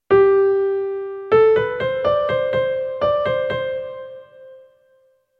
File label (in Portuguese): polifonia